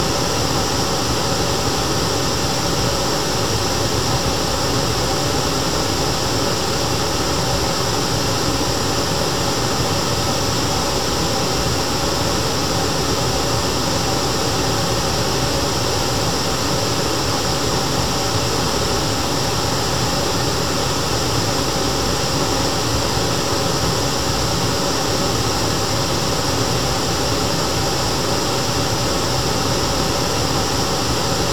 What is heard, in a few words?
Pink Noise